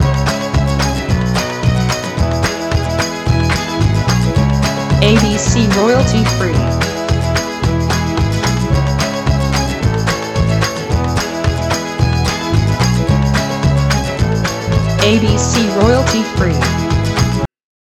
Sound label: Celtic Folk loop Lo-Fi